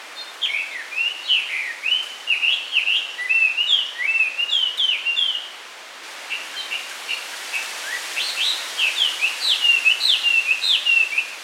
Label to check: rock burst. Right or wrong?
Wrong